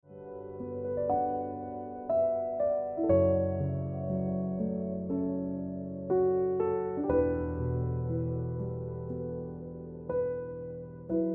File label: Piano Music